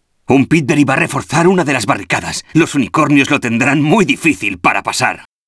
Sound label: Bonus; arthur and the revenge of maltazard; wii